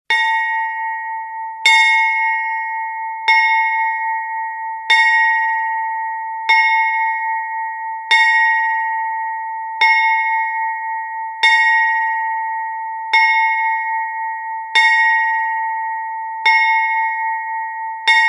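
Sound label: Church Bell Very Small, bell towers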